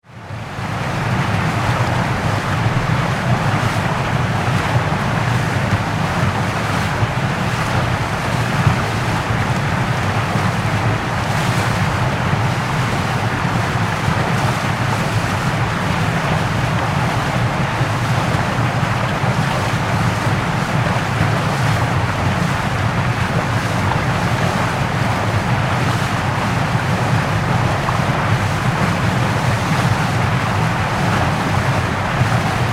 Waterfall, Heavy sheets of water falling - close shot; Nature; Tom Hutchings